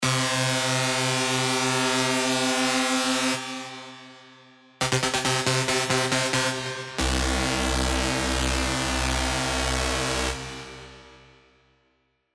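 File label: Lead, Sylenth1; alonso 19 year anniversary pack; Butterbee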